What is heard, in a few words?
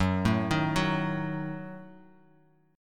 Gb Minor 6th; arpeggiated; JGuitar